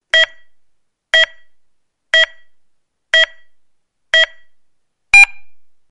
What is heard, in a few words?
start beep